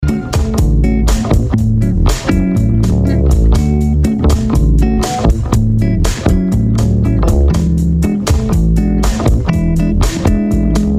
bossa

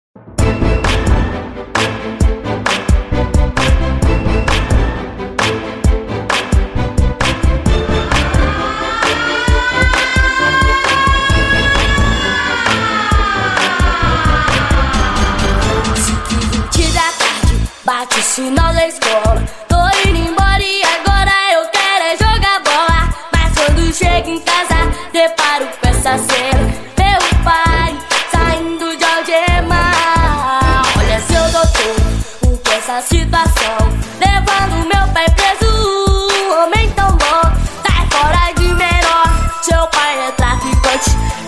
sirene da escola

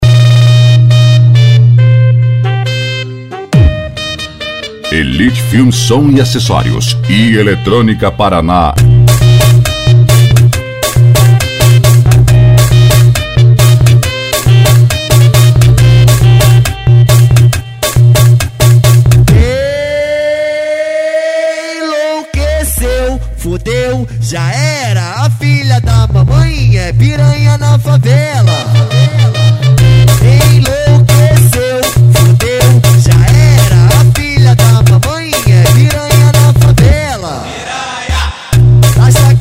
eletronica; parana; edicao fervo 2016

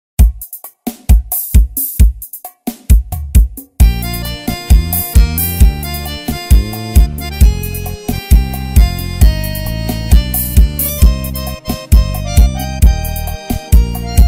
remix

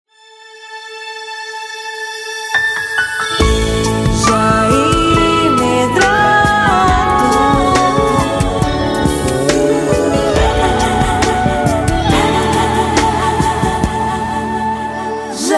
vinheta cantada

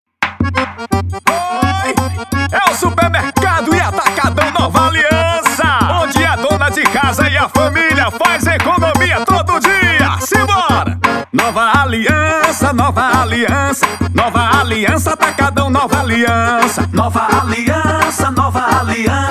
Jingle Pé de Serra; jingle supermercado atacadão nova aliança

